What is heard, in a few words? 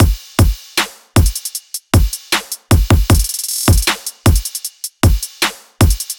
Hybrid Trap Kit 155bpm Full; Drums; EDM Drums; Drum Kits; Stems; Ghosthack; Ultimate EDM Drums; Drum Mega Bundle; Hybrid Trap Kit 18